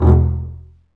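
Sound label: STRINGS, Cb Bowed Marcato; Roland L-CD702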